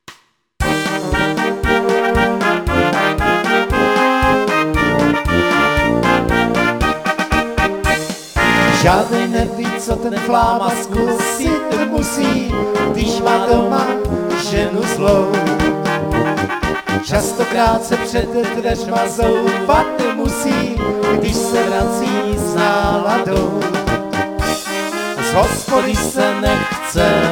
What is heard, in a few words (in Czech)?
zpev stereo